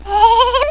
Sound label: alert, headcrab